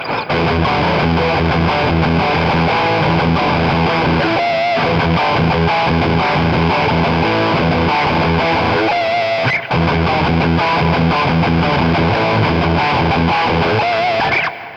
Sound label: Without Sterioizer